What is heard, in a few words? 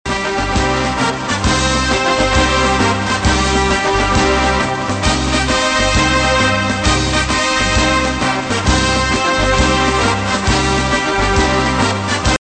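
army parade pakistani ringtone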